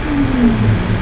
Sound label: Singing Sand